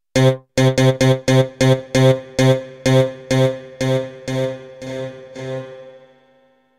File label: XP reverb, synth roland jd800; XP: reverb from dry to wet